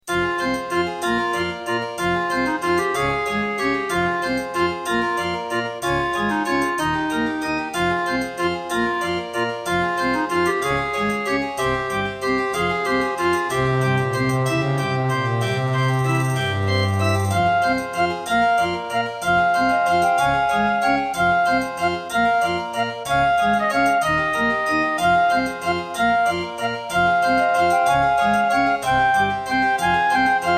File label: Roland SCB-7; Death Gate